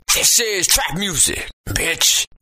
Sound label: trap music